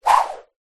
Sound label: foley rope or cable whip swish through air short spin